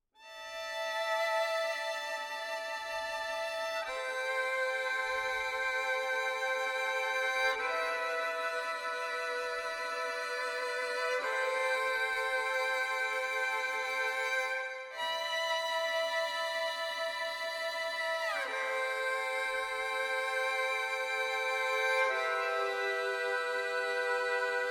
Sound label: Strings